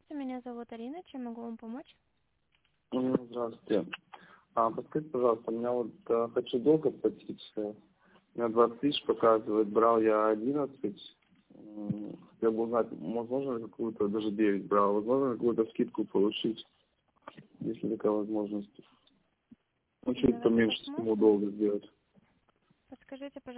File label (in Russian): Звонок